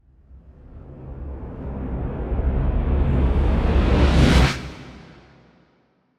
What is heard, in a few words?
Grenade Reverse Reverb